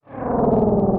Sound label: Decloak